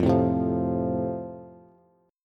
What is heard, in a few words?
F Major, Bass; strummed